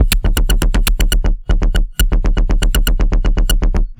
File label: Structured Rhythm, IDM Rhythms